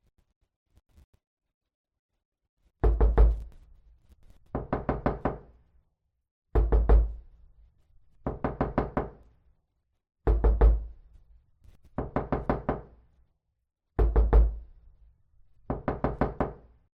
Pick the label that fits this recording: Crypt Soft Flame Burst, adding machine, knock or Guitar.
knock